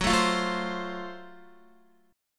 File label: Gb Minor Major 7th Flat 5th, Guitar, chord; strummed